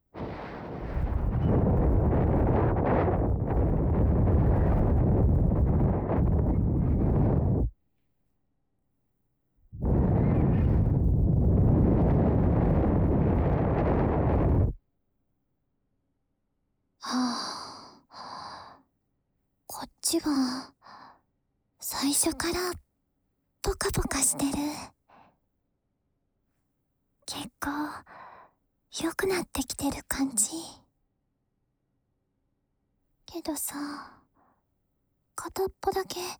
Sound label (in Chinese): 叮叮響著熊鈴的同時並用竹製掏耳棒+棉花梵天的正統掏耳棒進行左耳清潔; 【繁体中文版】【アニメ1話付属・熊鈴耳かき・添い寝】蓄音レヱル かにこ